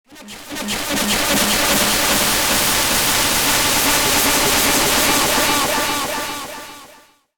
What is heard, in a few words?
Static Transmission Voice Delay, Synth Static Noise Blip; Production Element Imaging Element Accent Transition; Blastwave; Static Sound Effects